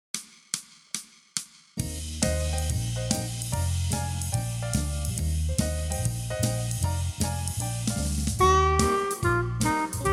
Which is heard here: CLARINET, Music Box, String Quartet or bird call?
CLARINET